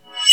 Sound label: reverse